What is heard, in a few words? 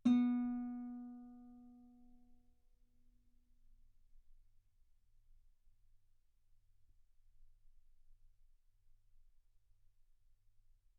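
Guitar ff B string B3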